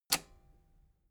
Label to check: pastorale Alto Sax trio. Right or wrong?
Wrong